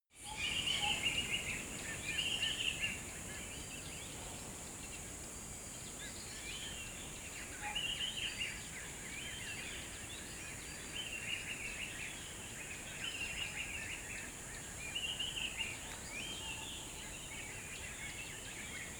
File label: river dawn